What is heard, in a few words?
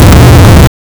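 stone grinding rock rumble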